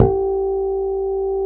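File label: MULTIPHONIC G4, FRETLESS BASS; Northstar - New Gold VOL-2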